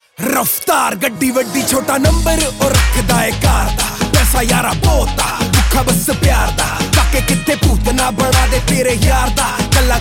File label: Rap